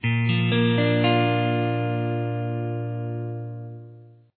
A style barre Bb, guitar

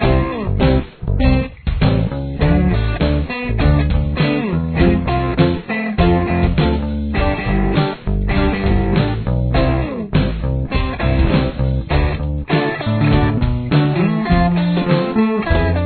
chorus, guitar

